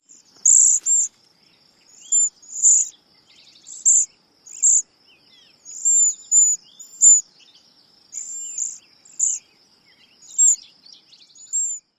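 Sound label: seee calls and trills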